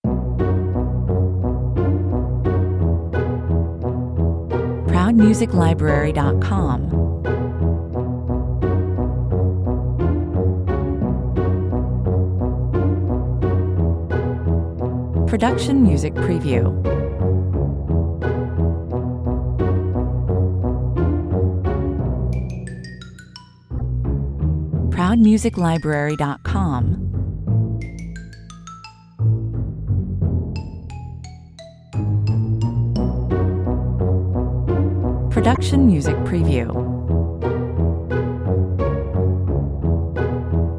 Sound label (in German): impulsiv, klingeln